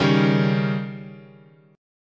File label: C sharp Suspended 2nd Flat 5th, guitar chord